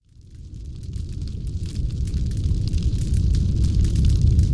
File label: Ambience Fire Intro